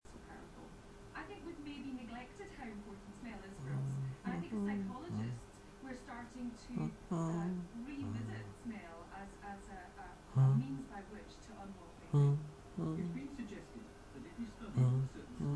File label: hum